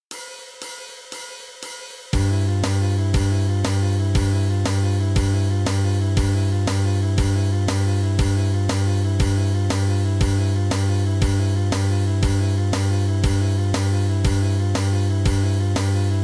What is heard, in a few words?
playback 120 bpm, guitarra; Estudo 05 - cordas Si e MI; playbacks 2 cordas